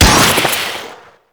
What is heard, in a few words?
Infested Beam Pistol Shot; Weapons